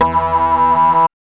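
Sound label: organ tone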